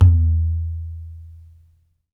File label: Tablas; Spectrasonics; Supreme Beats; World Dance